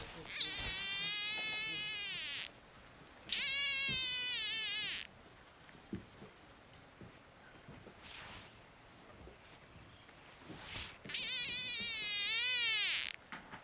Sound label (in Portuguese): Bokermannohyla circumdata canto agonia